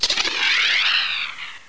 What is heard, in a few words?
physgun on